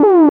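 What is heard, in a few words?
Siren; yume nikki; サイレン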